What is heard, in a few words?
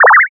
teleport